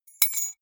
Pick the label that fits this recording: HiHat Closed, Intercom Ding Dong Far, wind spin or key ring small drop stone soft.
key ring small drop stone soft